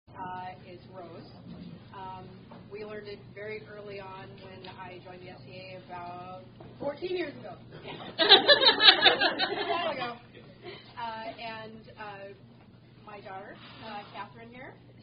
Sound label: Rose Round, Song; SCA Song